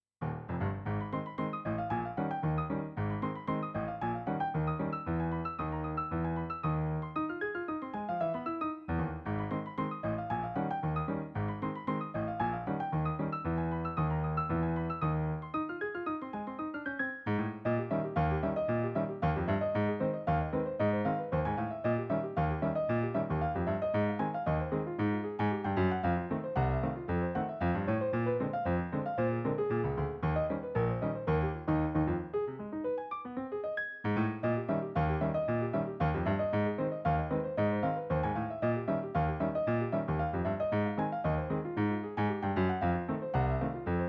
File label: SC880